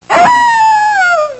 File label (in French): Coyote dans la prairie